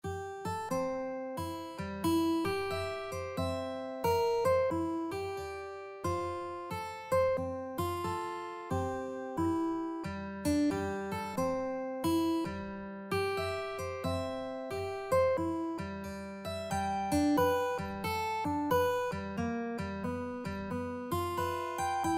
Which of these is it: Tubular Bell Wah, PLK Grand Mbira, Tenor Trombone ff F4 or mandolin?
mandolin